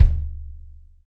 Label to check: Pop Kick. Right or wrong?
Right